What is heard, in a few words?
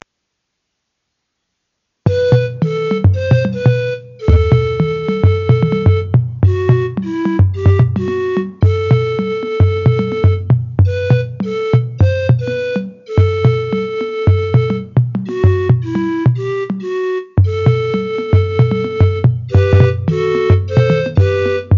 jungle